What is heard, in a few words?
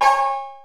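808 cowbell; Wizoo; Platinum 24 Electronic; 480L GA 808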